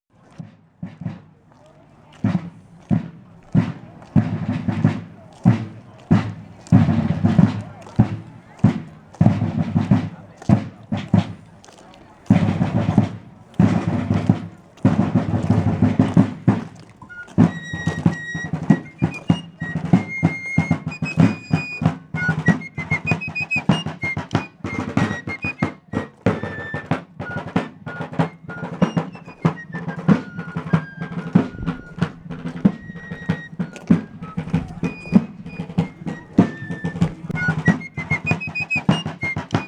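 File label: Fife drum ambient; battle grounds iii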